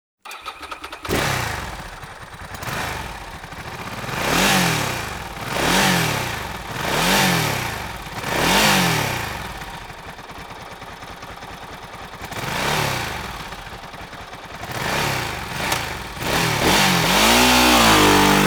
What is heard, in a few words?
Caviga Grand Canyon 900 ie, MC Lyde